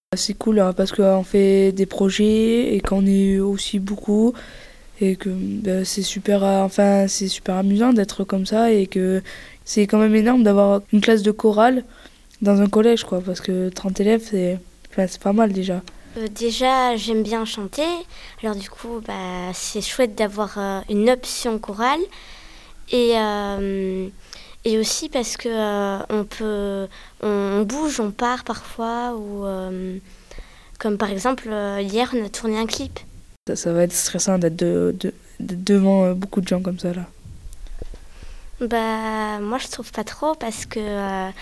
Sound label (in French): chorale